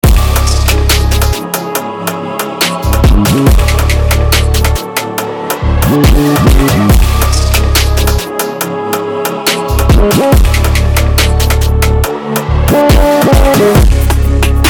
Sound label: Drill Drum Kit; Chaos